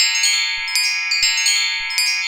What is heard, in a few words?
wind chimes C4; Big Fish Audio; Synth City